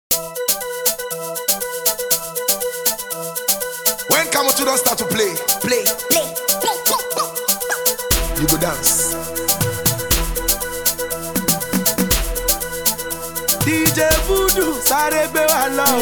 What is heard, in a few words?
Banger Beat